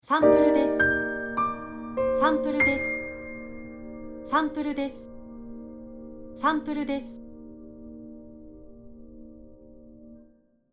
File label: Logo Piano